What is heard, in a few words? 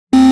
Emergency Buzzer; Electricity; Thalassophobia Restored